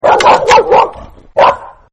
Dog Mean Bark, Dog Barks, Scratches, Growls And Yelps-Close Perspective; Dog Sound Effects; Animals